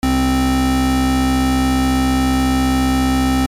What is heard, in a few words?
Saw Pulse